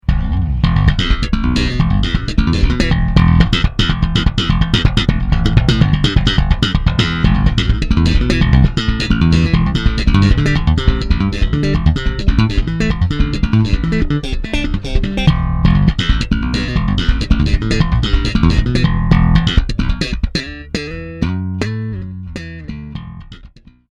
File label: Slap